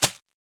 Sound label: Arrow hit